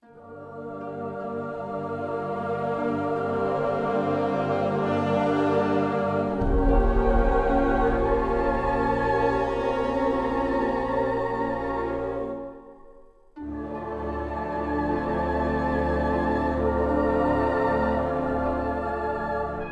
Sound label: castle music